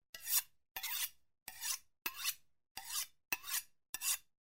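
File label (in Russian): tochat noj, sharpening a knife; Звуки на которых точат ножи; Звуки на которых точат ножи, много разных хороших вариантов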